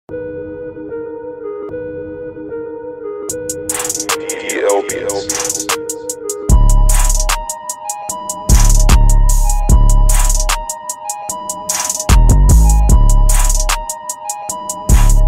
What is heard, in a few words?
Instrumental